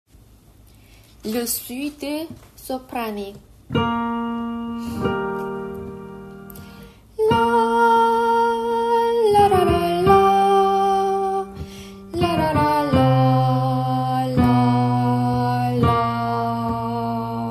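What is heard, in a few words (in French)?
soprano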